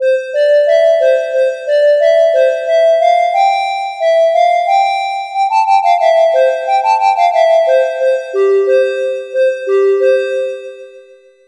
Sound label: two tiger echo, syn music; 迴音(0.15s); 合成音樂(Synthetic Music); Csound orc